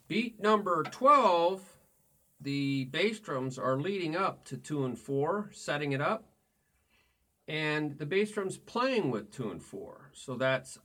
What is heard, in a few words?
Beat 1 2 3 4 Toom Ba Choom Toom Ba Choom